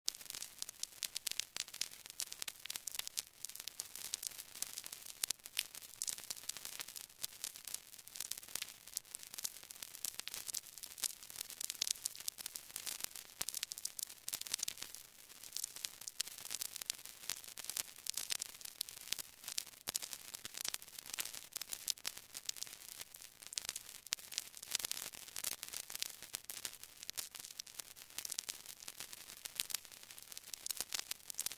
zvuk pustoj plastinki

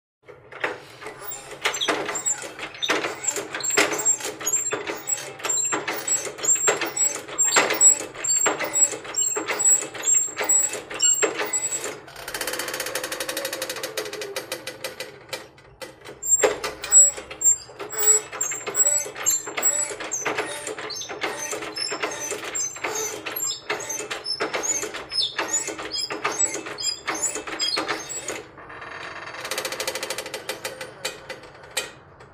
zvuk gidravlicheskoj krovati v bolnice

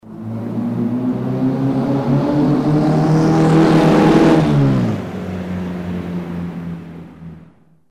zvuk forsunok

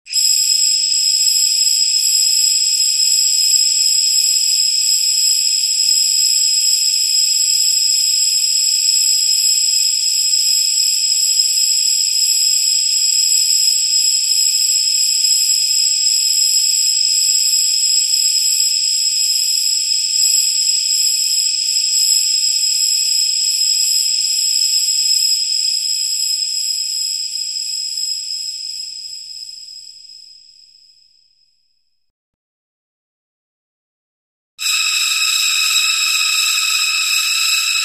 spuskajuschijsja vozduh

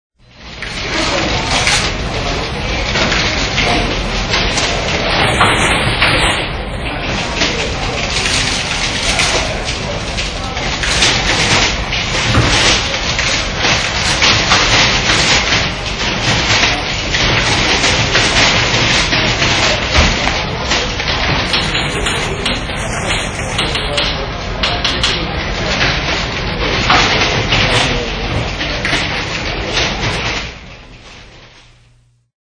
shum v ofise

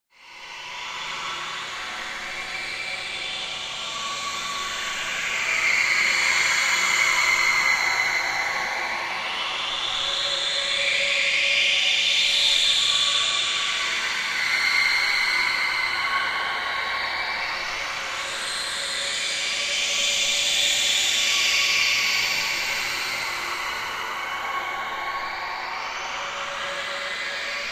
zvuki prizrakov

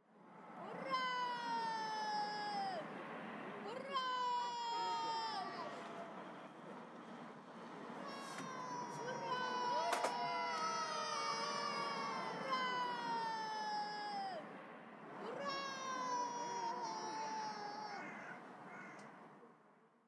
Deti krichat ura

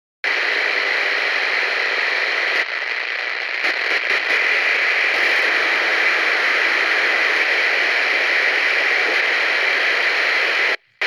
zvuk shuma racii

